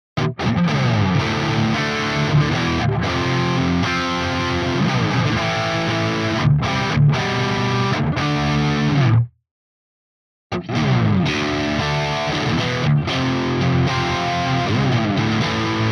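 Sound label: RGT1270 rhythm all positions Riff, guitar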